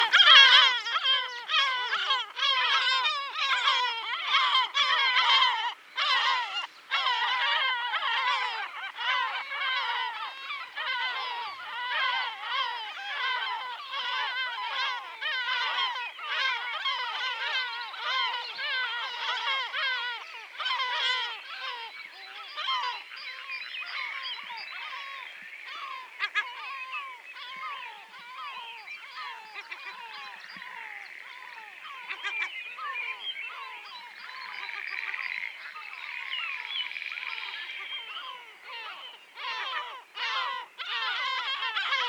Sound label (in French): Goéland leucophée (Larus michahellis) Nombreux oiseaux divers cris